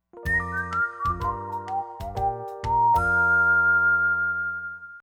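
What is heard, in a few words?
jingle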